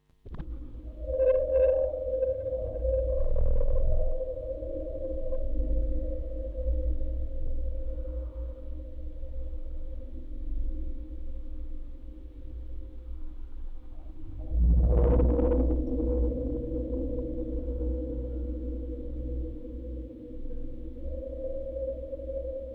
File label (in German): Aufnahme Tape out, Spieluhren-Quartett mit Tonband; Fieldrecording